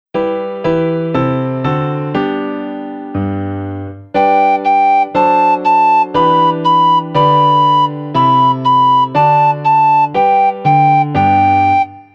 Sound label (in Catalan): Diàleg musical